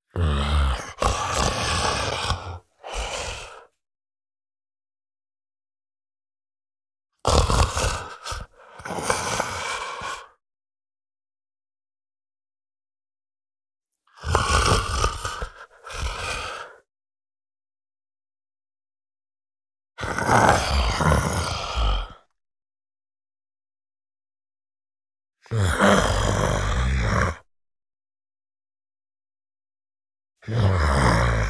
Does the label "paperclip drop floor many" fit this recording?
No